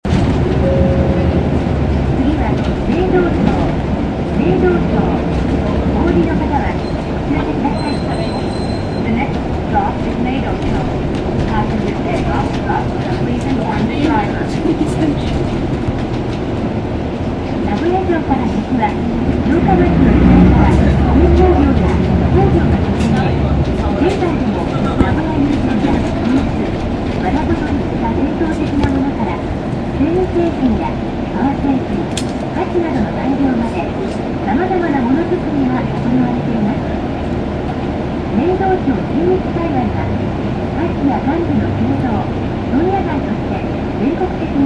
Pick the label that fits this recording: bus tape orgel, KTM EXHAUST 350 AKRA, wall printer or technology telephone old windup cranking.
bus tape orgel